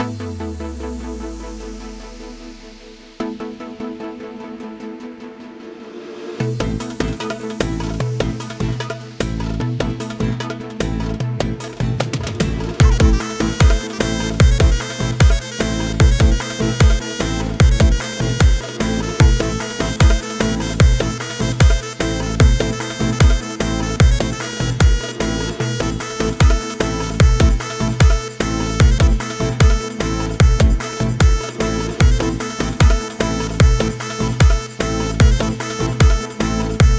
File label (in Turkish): arabesk oryant; by burak sound bank; arabesk oryantal tema vol 1